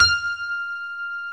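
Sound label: grand piano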